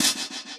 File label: Hat